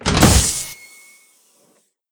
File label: door sound